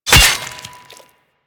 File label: Melee Axe Impact Flesh; Warframe; Weapons; Axes